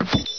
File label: button; hl2; buttons